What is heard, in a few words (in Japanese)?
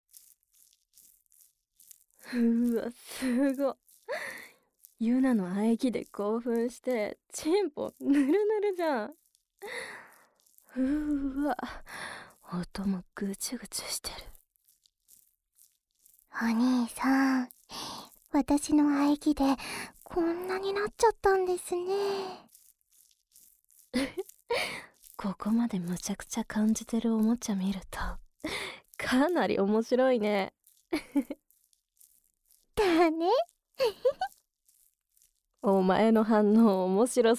同時両耳舐め射精我慢